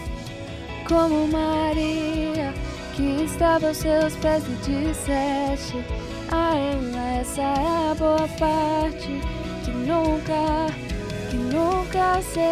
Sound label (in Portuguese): CONTRALTO